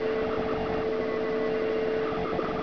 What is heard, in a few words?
signal, binoculars; hl2